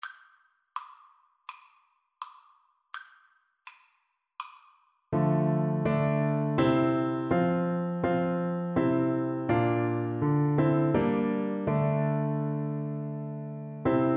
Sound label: Bassoon